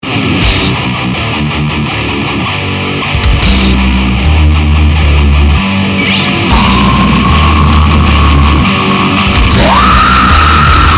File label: Extreme metal; AVGN